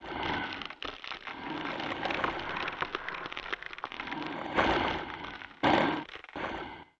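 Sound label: Skeleton Warrior Idle; Seer; GamigoNA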